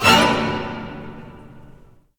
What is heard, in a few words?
zombie stinger; DeadFright